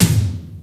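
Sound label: Boosh tom, Roland JD-990; Drum Samples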